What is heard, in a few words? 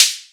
open hihats, techno; Techno ID; single-drums